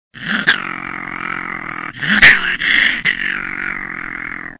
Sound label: Angry Grey Juvenile, predator calls